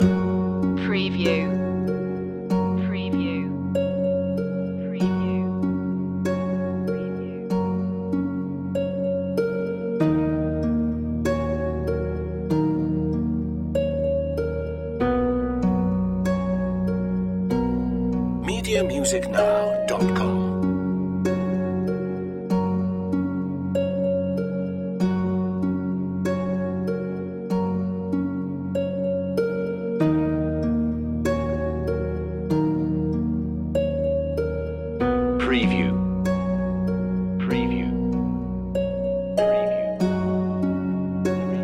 oriental meditation loop

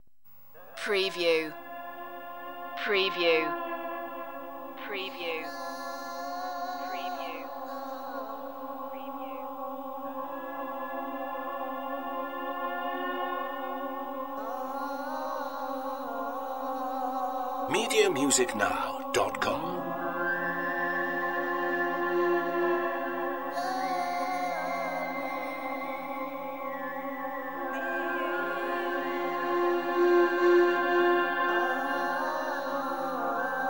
ethnic voices

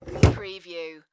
Foosball Table Goalie

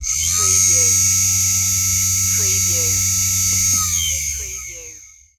Servo Motor; sound effects bible

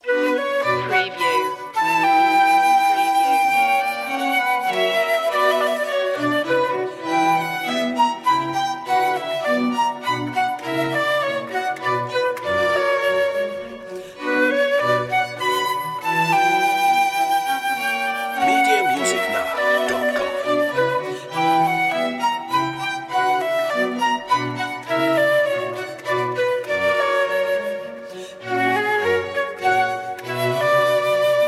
orchestral suite menuet no harpsichord; bach; bwv 1067

